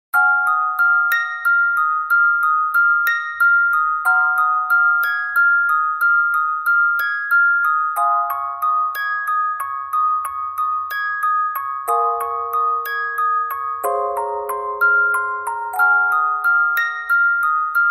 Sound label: Merry Christmas